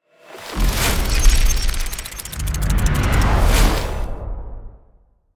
Cinematic time freeze slow motion clock

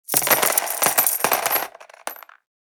Coin tray cascade slot machine casino

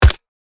HK416 assault rifle suppressed shot

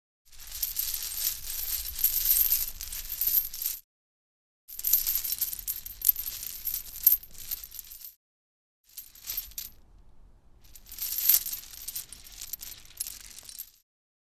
Metal necklace jewelry movement

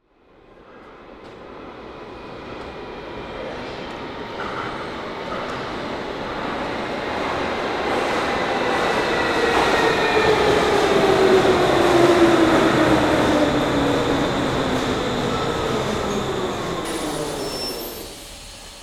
Train approaching station decelerating arrival; vehicle

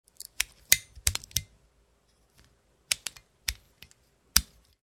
Bic lighter clicking multiple clicks

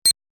Computer beeps digital menu single beep; sci-fi

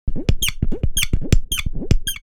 High pressure handgrip sprayer spraying; hand tool

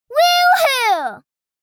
Woo hoo cheering girl child kid

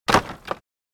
Cartoon falling body impact

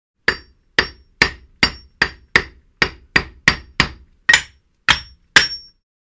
Hammer hitting a wall hammering

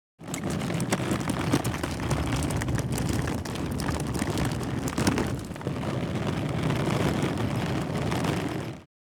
Suitcase on wheels movement on concrete

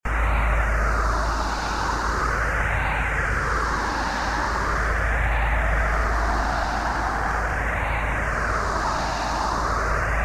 Sci fi apocalypse loopable reactor energy pulse

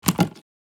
Headphones set down; headphones and speakers

